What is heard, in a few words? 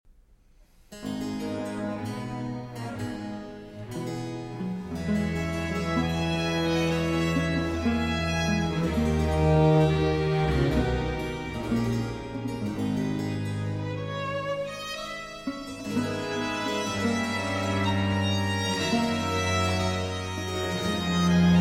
Cello Concerto in D Minor, Adagio